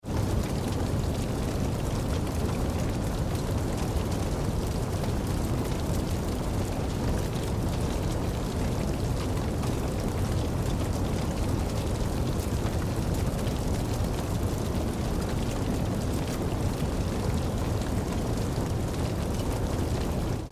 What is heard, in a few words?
Large fire wooden house burning down